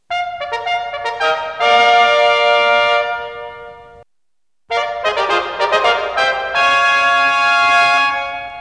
FANFARE

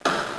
BOUNCE; MOONBALL

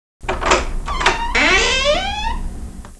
DOOR SND

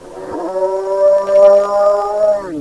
SWAMP

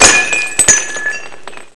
break; sci-fi sensation

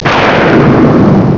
thunder

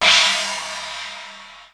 LARGE CYM; BEAT2000

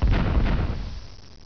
BOOM; LEDWARS

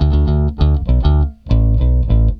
BASS, ROCK POP; MAGIX

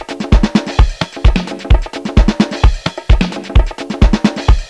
BONGO; 999wavs

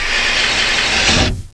CASE OPEN

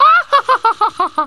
AHAHAHAH

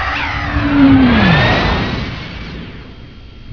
warp; sci-fi sensation

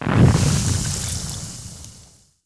FIRE OIL; KRONDOR

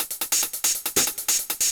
HIGHAT, HOUS TEC DRUMS; MAGIX

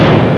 KABOOM; WAR

